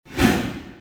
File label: item respawn; Soldier Of Fortune II Double Helix; XBOX